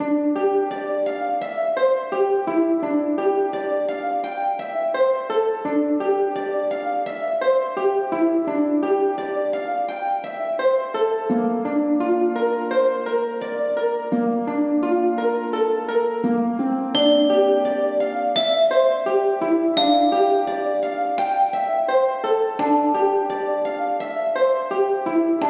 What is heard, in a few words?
Mysterious and beautiful music